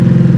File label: engine medium